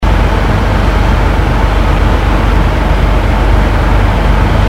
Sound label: harvester idle; gdi